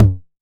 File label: TOMS 909